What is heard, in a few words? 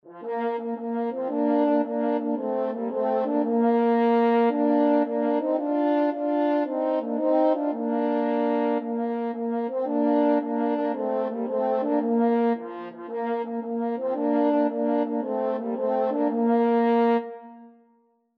french horn duet